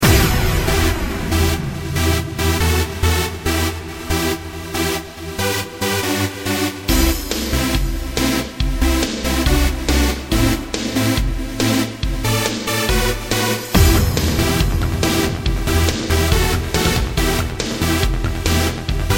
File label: Trance Anthem, rocking drums Remix